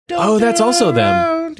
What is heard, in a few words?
singing